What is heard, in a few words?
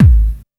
kick dance, techno trance drums